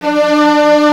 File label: strings, arco marcato; Roland LCDP13 String Sections; STR Combos 1; CMB Arco Marcato